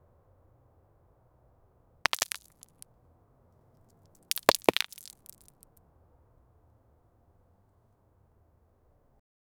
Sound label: small rocks falling from; crunching